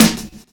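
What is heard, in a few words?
drum chop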